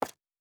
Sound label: Footstep Concrete Running; Character Sounds